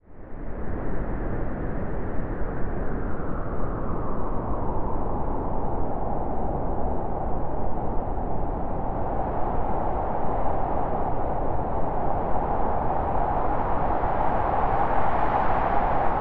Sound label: wind artic cold